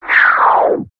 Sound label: wpn hud off